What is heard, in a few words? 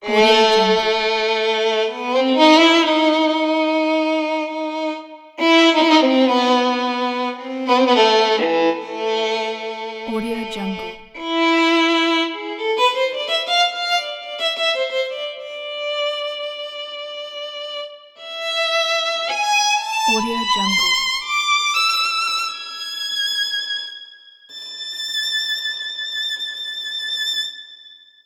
short violin intro